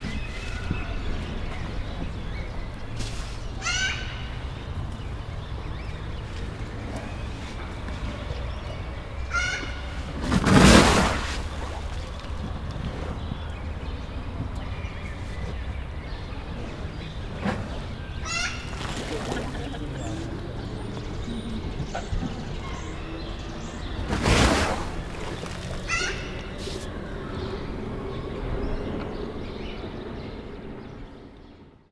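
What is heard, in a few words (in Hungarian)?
sarat dobál a hátára, ázsiai elefánt; nyiregyhazi zoo 2010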